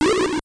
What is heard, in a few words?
boss spit; super mario bros 2 nes